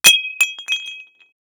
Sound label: Impact Ceramic Bowl Hits Concrete; Impacts